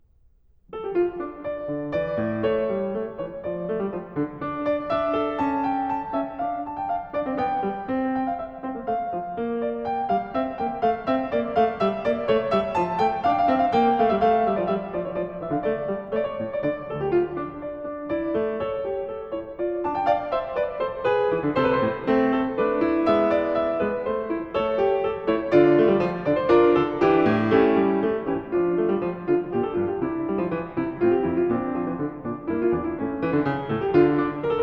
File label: toccata in d minor fuga; bach